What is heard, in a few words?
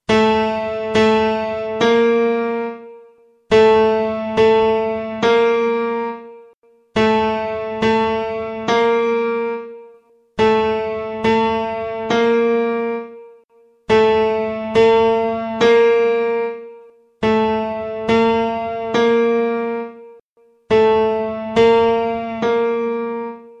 piano